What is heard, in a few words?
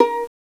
VLA PIZZ A, Fairlight CMI, STRINGS; STRINGS3